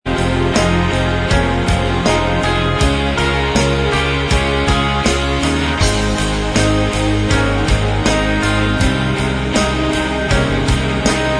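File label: instrumental, backing tracks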